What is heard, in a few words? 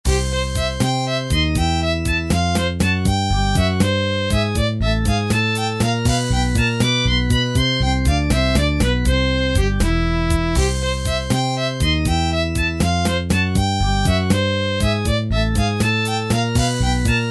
drums